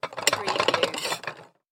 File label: Dishes Stack Set Down